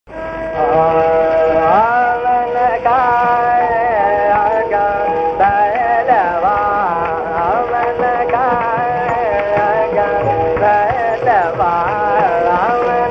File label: purvi 78rpm